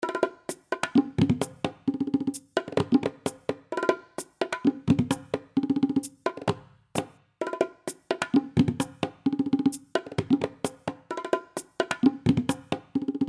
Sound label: percussion loop; weeklybeats 2012